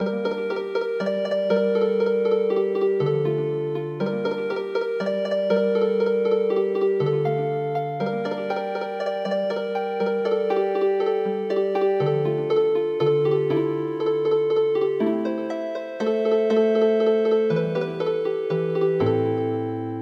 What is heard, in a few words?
Healing Harp